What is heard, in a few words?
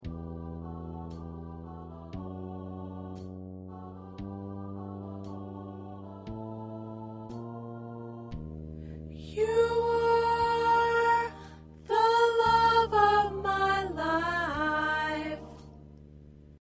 P Soprano